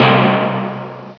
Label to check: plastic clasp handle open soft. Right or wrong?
Wrong